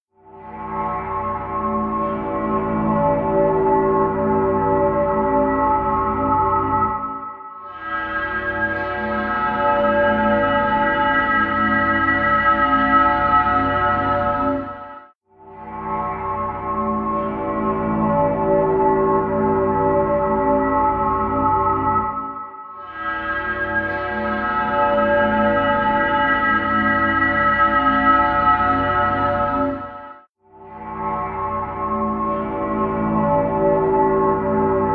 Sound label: Drone fast